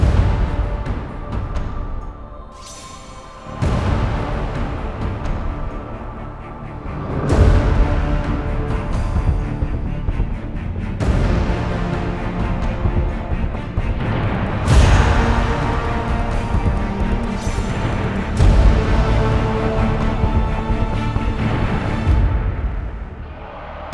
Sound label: Intro free Spin Amb; netent